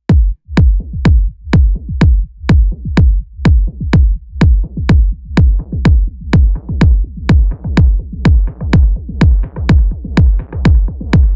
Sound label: Kick Filter Delay; Timeline